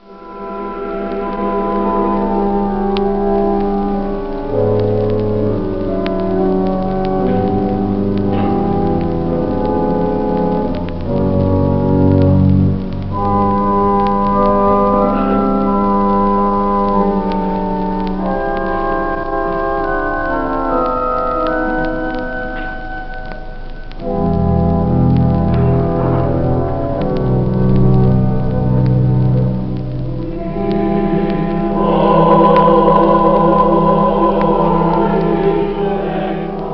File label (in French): les jeux de fond du clavier de récit, orgue; orgue cath oran